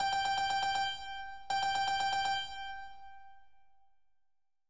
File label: ring; プログラムタイマーみたいの